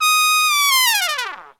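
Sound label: trumpet long fall; OMI - Universe of Sounds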